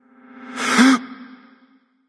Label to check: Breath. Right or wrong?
Right